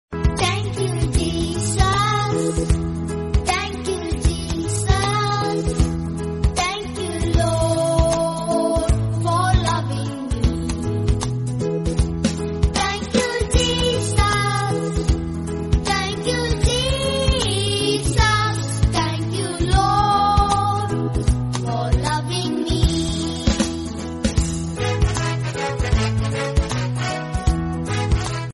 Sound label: thank you jesus english